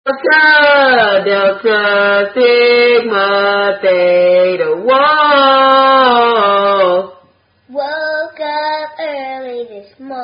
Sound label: Delta Sigma Theta Sorority Song